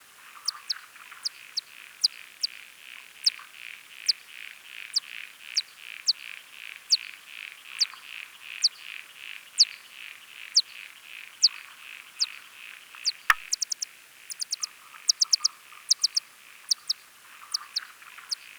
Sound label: Nyctophilus geoffroyi